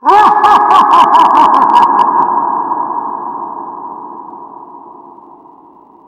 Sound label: win; ff2; whitevolcono wizard